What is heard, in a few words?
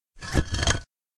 Medium Rumble Walls; SyrinScape; Hunting horror battle